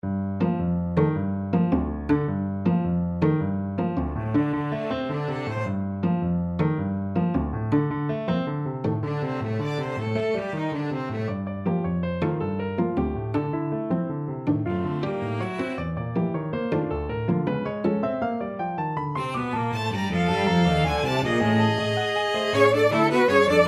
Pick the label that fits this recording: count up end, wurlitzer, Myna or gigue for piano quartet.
gigue for piano quartet